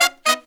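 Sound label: Pop & Funk Brass, 110 Abm, left; Best Service ProSamples vol.25; AKAI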